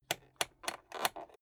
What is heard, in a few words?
rotary control short turn